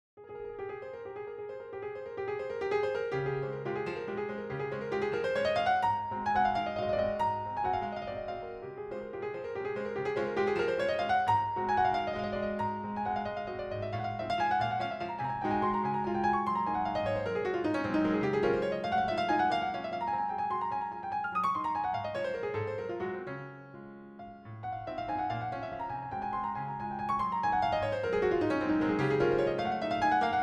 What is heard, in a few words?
waltz op64-1